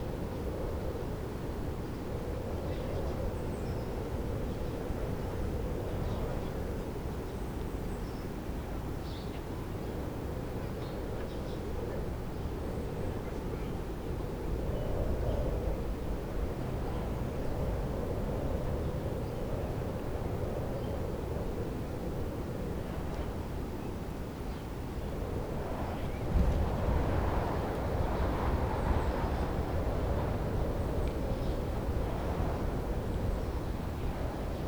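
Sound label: ambience dinners rooftop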